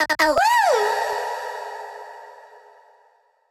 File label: awoo